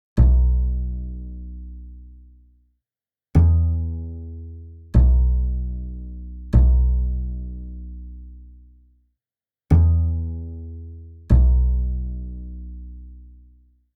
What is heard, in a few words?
151 BPM B Min Bass, Trap; Cymatics; 2022 Melody Collection; G Wagon